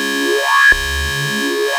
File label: OSCAR D2, Rare Synthesizer; Hollywood Edge - Giorgio Moroder Rare Synthesizer Collection